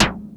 Moog SD; Moog Modular55

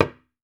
Talking Drums, Retro Sampler; EMU E-III

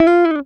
GTR TRIK, Jazzistic; Univers Sons

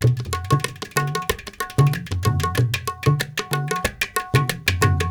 India, Heart of Asia

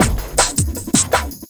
Loop, Drum & Bass, 160 BPM

